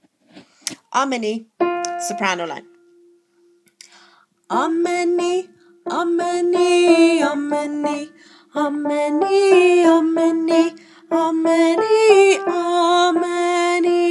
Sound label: Soprano